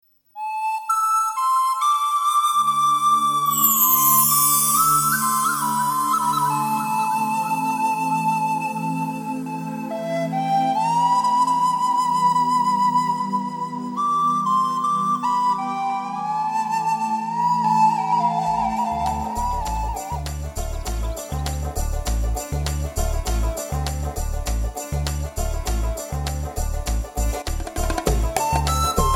Flute Slow Meets Fast Version; Majunu; Tamil

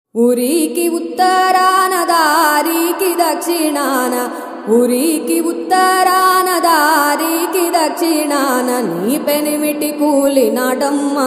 (Female), Telugu Mp3 Songs

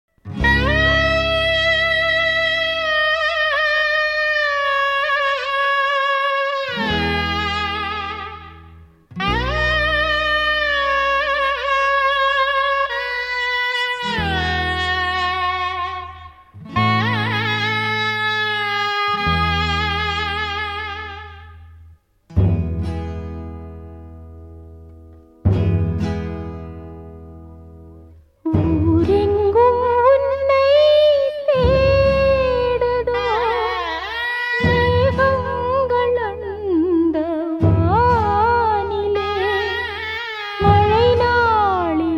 Female Version; Tamil

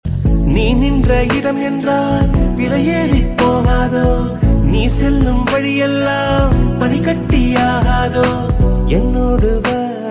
Ringtone, Tamil Ringtones; 2009 Tamil Hit Ringtones

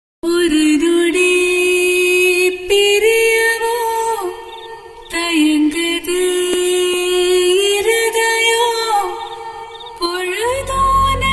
Sad Female Version, Tamil BGM Ringtones; Rummy

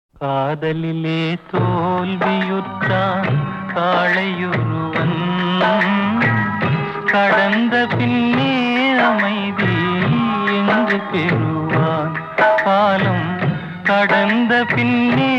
Male Vocals; Kalyana Parisu; Tamil

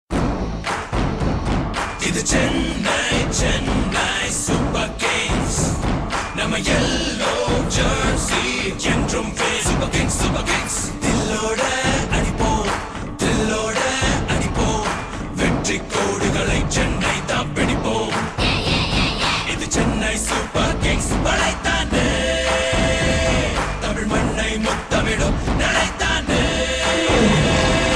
Theme, Tamil Ringtone; 2009 Tamil Hit Ringtones

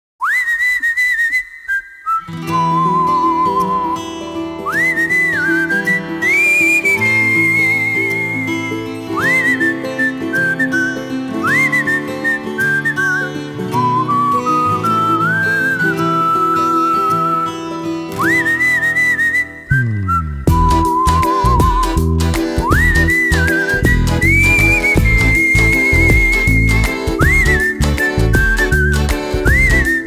Waltzing Whistle; Tamil

